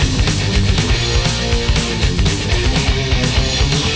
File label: loop